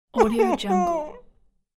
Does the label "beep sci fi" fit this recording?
No